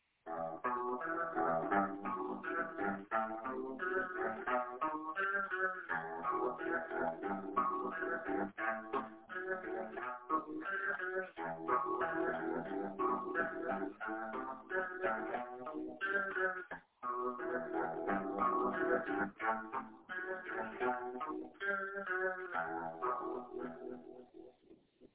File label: weird dark vibe arpeggios